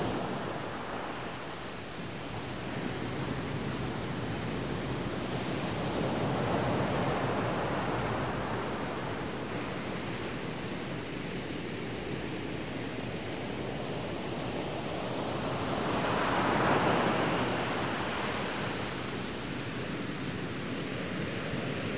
OCEAN SEA

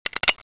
webley cock; svencoop; ww2projekt

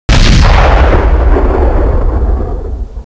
tank shoot

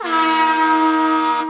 siren

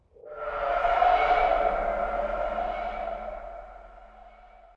Dino Distant; docks

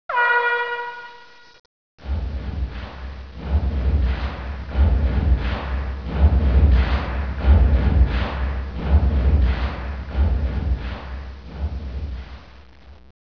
main horn

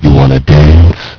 dance

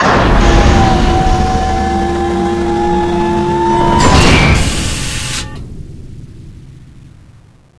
core door close; dm criticality

